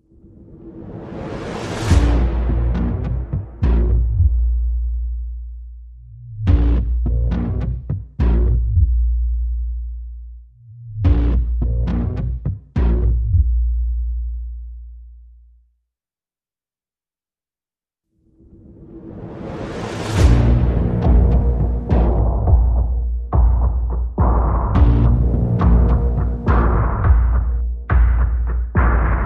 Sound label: Percussion Pulses and FX